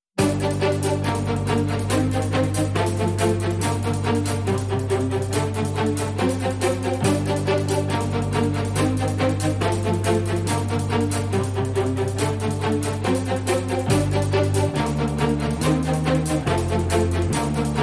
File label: Straight Drums Bed Mix